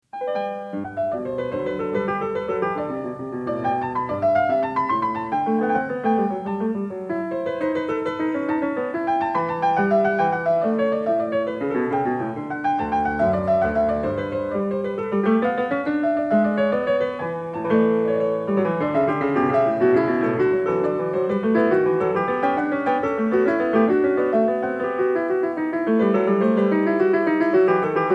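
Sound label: Allemande, piano; Partita 5 in G; BWV829; BACH; PARTITAS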